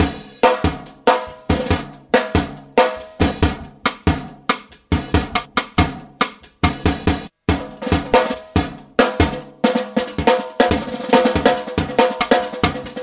140 superjazzy drum, drum loops and breaks; 131 to 140 bpm; samples-ghost

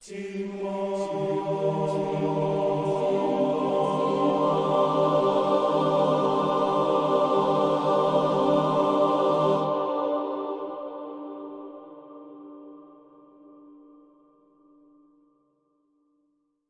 phasing choir layered buildup

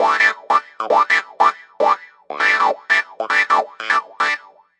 Phunky Wah Clav; KEYS ORGAN PIANO LOOPS

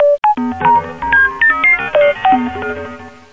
computer

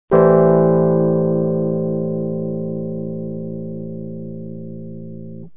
rhodes chord; Piano and Epiano

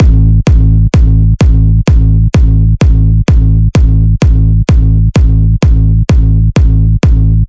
kick drum brain damage, 128 bpm

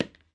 Taka, Tabla Kit Large; ETHNIC and WORLD PERCUSSION

